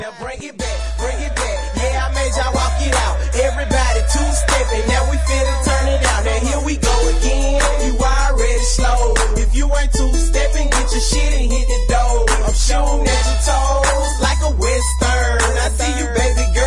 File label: 2 Step